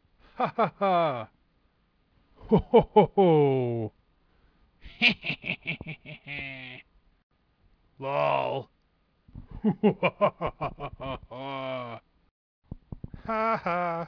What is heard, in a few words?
laughter